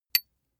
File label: small metal impact medium high; industrie wirtschaft; metall